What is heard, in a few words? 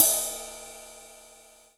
Korg NS5R Power Ride Cymbal